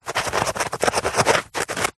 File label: pen ballpoint writing on tissue paper; office